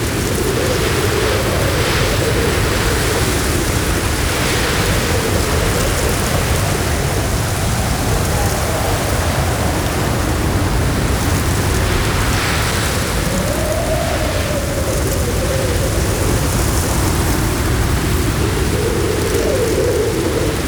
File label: Sandstorm Loop; Warframe; Mummy